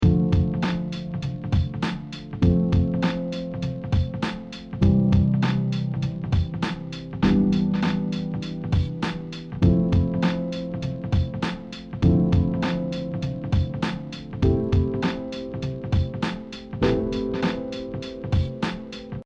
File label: scaler 2 chord